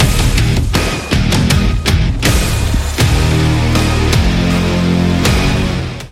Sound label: character select follow sting